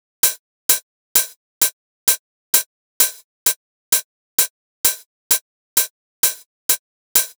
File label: Hi-Hat, EDM; Sonic Foundry (Sony Creative Software); Afterhours EDM